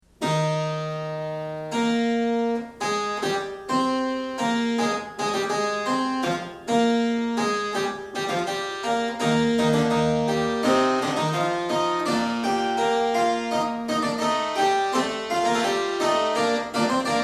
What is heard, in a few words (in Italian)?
fuga; bach; bwv876